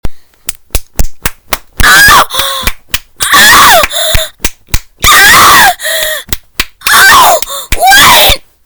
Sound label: clap button